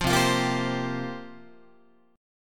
C 7th Suspended 4th, Guitar, chord

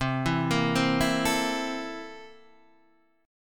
C 13th C down Guitar Half Step Down

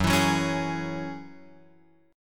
F sharp 7th Suspended 2nd Guitar Open F, chord; F#7sus2 strummed

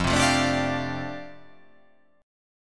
F 6th Add 9th F down, chord

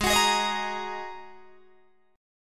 Ab 7th Suspended 2nd down Guitar DADGAD

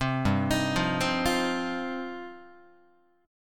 G 7th Suspended 4th Sharp 5th, Guitar

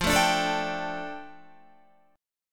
F 6th Add 9th F down Guitar, chord

